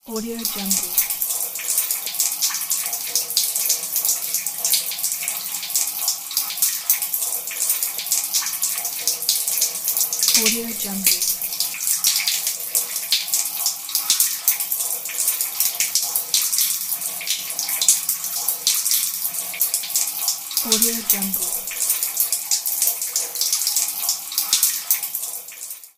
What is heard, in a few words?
sewer ambiance